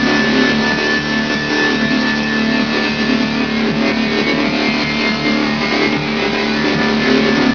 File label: alien mind drill, ambience